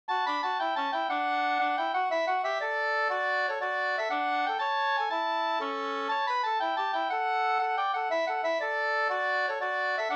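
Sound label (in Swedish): slangpolska, two-part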